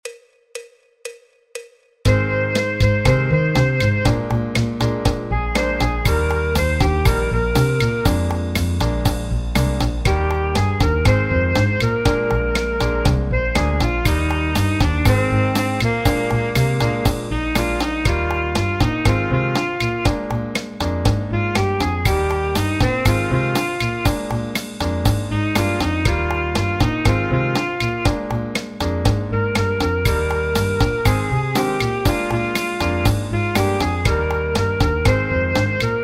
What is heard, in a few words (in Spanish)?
Saxo Alto y Baritono Sax en Do Mayor; Popular de Jose Fernandez Diaz